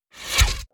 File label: skill effect